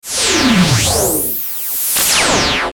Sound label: Stinger Electric Sweep; Production Element Imaging Element Accent Transition